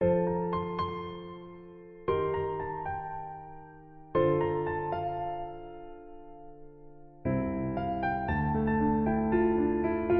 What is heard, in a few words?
Piano; Honor Magic 7 RSR Porsche Design; alarms